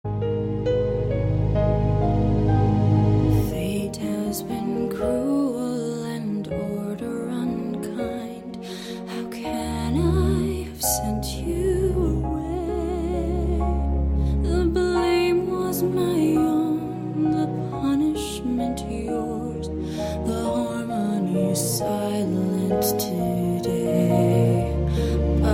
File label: Lullaby